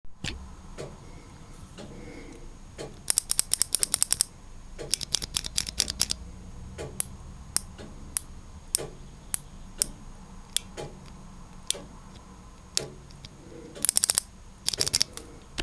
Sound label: Kailh Bronze vs Gold comparison